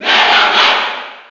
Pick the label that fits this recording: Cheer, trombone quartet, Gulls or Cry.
Cheer